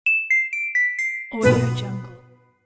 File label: cartoon game over